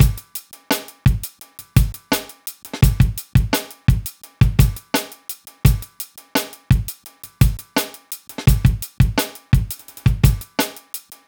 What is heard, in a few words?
Live Breaks, 85